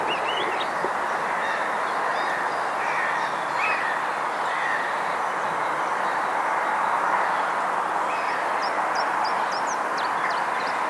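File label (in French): pouillots veloce fitis etang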